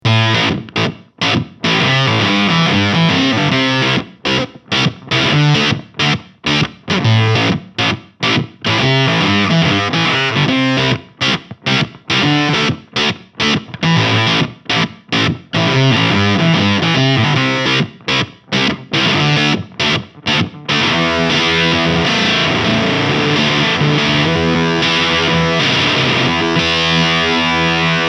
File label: Warm Audio Foxy Tone Box Texas